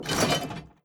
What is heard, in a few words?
lever